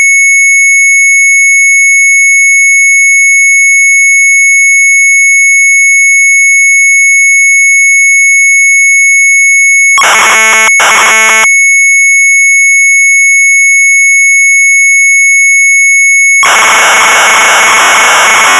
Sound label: Sharp MZ800 Tape; retromelsarchive